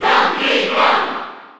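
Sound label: Cheer Spanish, crowd; Donkey Kong; SSB4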